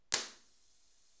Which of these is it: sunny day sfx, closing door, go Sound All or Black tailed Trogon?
go Sound All